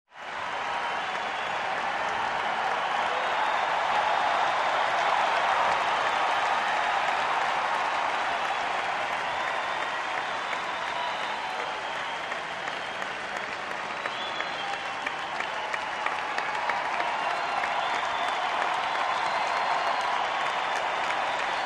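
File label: Crowd 50000 Whistle, large int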